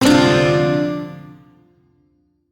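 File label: Shawzin Major Chord; Warframe; Duviri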